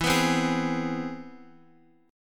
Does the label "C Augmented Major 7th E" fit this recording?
Yes